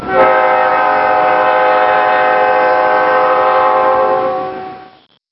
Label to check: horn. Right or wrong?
Right